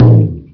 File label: bounce; Birthday Party Bash; Wii